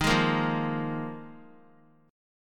D sharp Suspended 2nd Suspended 4th D sharp; strummed; JGuitar

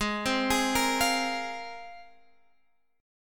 C 7th Suspended 4th Sharp 5th, G sharp

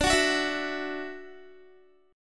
D-Major Add 9th D down Guitar; Dadd9; strummed

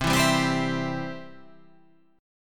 C Major, C, down, chord

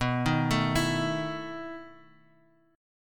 B 11th B Guitar Half Step Down, chord

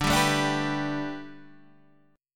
A 9th Suspended 4th, D

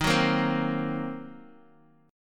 D sharp Minor 6th D sharp down Guitar Open D

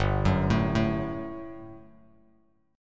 G Major 7th Suspended 2nd, Bass Standard; GM7sus2 arpeggiated; 3,9,7,2

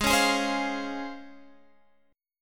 C sharp Major 7th

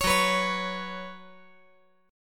Gb Suspended 4th Gb, down

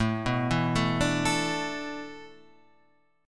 A Suspended 2nd Suspended 4th

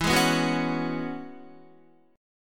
E 6th Add 9th E down Guitar; E6add9 strummed

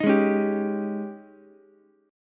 Ab Major 7th Suspended 4th Ab down Ukulele; AbM7sus4; strummed

